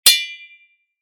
udar metall; Звук мечей; Звуки сражений на мечах, звук ударов меча

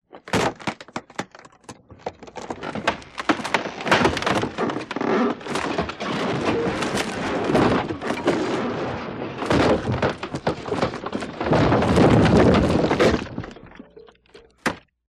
derevjannyj dom skrepit i razrushaetsja; Звук землетрясения в доме; Звук землетрясения в доме, когда трясется мебель, падает посуда с полок, звуки скрипа, гул

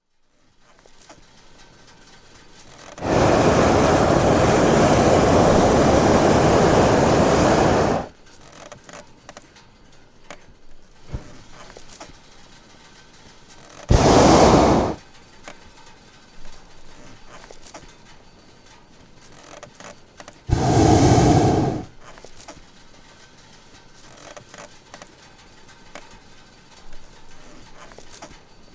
letatelnyj shar nabiraet vysotu

